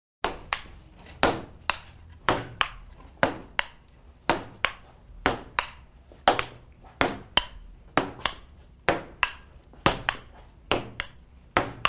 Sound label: Ping Pong Volley; Sports